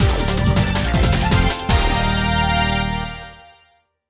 levelup; Mega Man X Command Mission; E3 Version; PS2

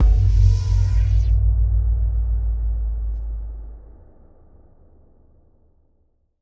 UI Menu SFX Emblem Layer1; Monster Jam Steel Titans

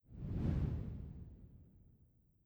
Switch Room

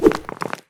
Roll Sound

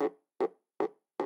Insect Weird

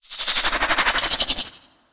Malediction Insect

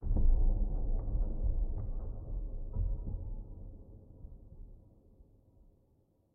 Room placed low

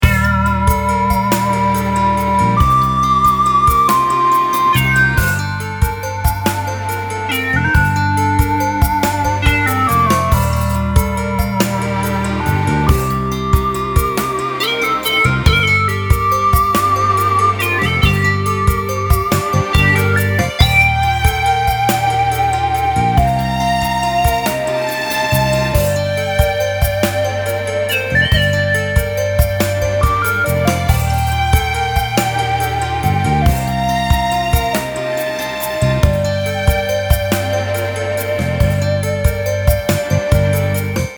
Swamp

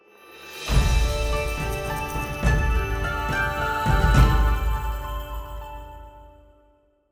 bgm quest result reset; Monster Hunter Rise; Xbox Series X/S

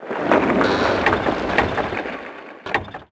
Laddercar Die; Knight Online

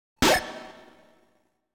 TRAP; Sounds of Wizardry; Game Sound Legend Series; Apollon Game Music Box Memorial

